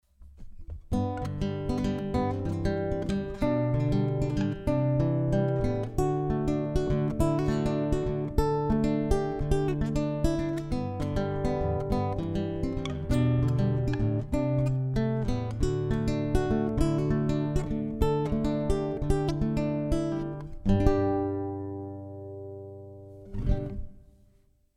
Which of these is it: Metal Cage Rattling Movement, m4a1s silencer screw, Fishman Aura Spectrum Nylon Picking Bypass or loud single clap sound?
Fishman Aura Spectrum Nylon Picking Bypass